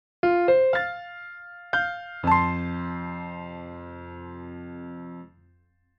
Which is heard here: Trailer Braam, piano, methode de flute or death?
piano